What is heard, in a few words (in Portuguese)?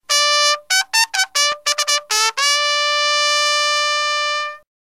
companhia